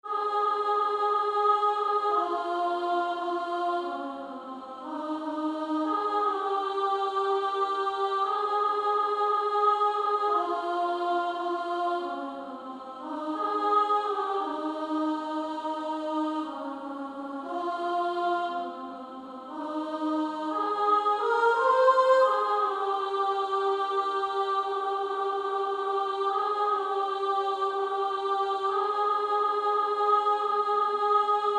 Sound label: Female Choir, SSA 3-part, Practice Music; 2nd Soprano